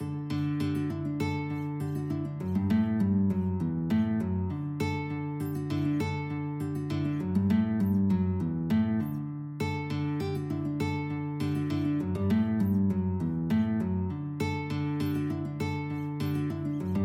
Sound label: musette, guitar